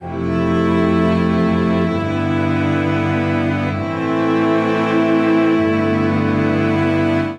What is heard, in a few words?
Progression C Major 130 bpm, string quartet; IVmaj7-V6-vi-V9-IVmaj7-V6-vi; MIDI Clips; Forgiveness; Ableton; Factory Packs; Spitfire Audio